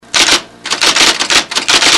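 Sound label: typewriter, office; machine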